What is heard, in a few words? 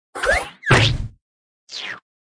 Emote Spatula Mini PEKKA